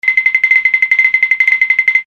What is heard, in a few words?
Cartoon Bell